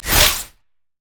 wildcards; LoL; twisted fate

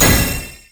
shield; LoL; lulu

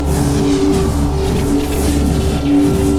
summonerspell base barrier; LoL

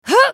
spell cast effort; LeBlanc

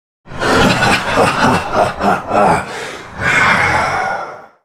laugh; Nocturne

